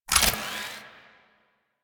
Shotgun Quick Reload Layer; Weapons; Warframe; Pax Duviricus

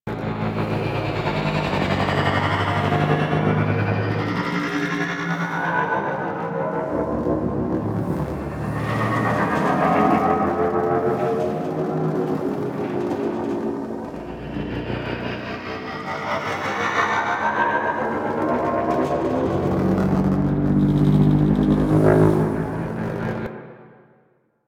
Thruster Ship Rotation Loop; Warframe; DE; Vehicles; RailJack; RailJackShip; AlternateEngines

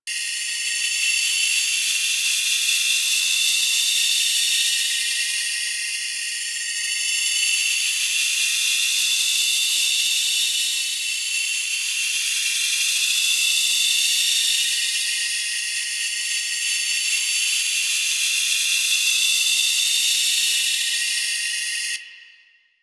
Battery Loop; Warframe; Cowgirl

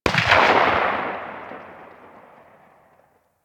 Weapon Enemy Tail Outdoor; Warframe

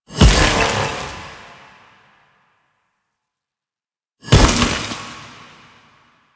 Qorvex Idle Noble Pistol; Warframe; Warframes; Idles

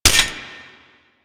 Shotgun Low Clip Mech; Warframe; Veilbreaker; Kahl

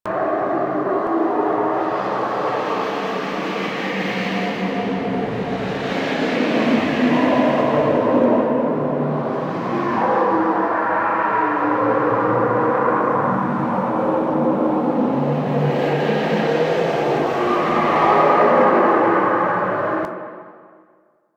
Settlement Int Cave Amb

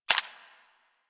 SMG Mech; Sundial

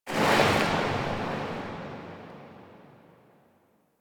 Bow Tail, Weapons; Warframe; Corpus

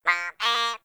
Flying Robot Voice; Quests; Veilbreaker; Ambience; Kahl Quest

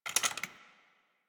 Fire Stop Mech, Flame Thrower; Weapons; Warframe; Ignis Wraith

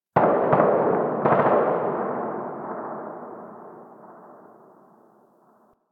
Distant Gunfire; Warframe; Ambience; PVP

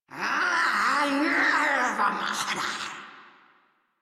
Scream; Warframe; Grineer Catbrow Handler

